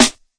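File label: classic hip hop snare; STYLE KITS (Hip Hop, Etc); Classic Hip Hop Mega Kit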